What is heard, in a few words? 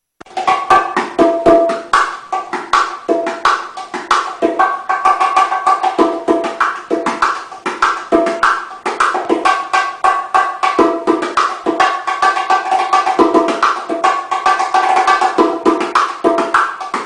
bongo